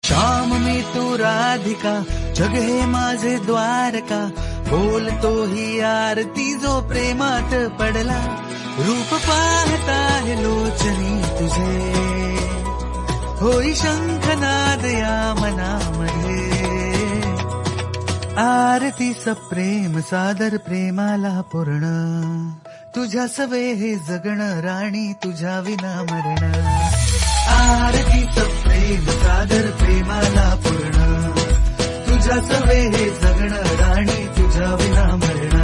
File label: Marathi Ringtone